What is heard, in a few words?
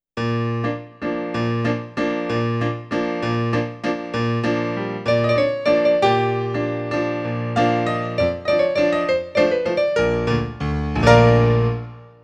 Tenor-accompaniment